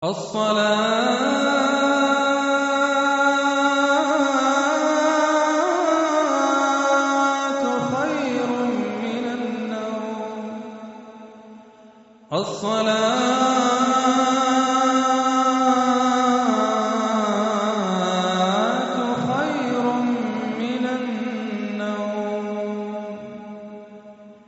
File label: QURAN TILAWAT RINGTONE